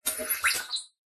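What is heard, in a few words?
science fiction robot small cute beeps chirps metallic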